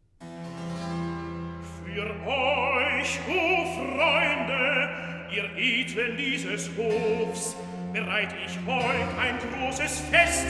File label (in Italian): Recitativo; Belsazar, Nitocris; Für euch, o Freunde; Handel Masterworks; Klassika